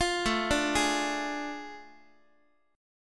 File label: A Major 7th Suspended 4th Sharp 5th, Guitar; AM7sus4#5; arpeggiated; JGuitar